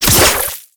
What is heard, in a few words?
Flechette Whoosh; Warframe; Tenno; Oraxia